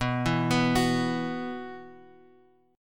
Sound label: B Suspended 4th; Bsus4 Chord {X 2 2 X 0 2} arpeggiated